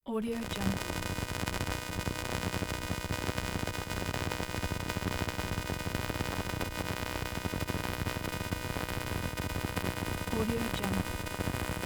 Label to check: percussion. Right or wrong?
Wrong